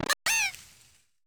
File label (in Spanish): Grito; Floragato; Gritos de Pokémon de la novena generación